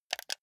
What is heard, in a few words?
console controller click combination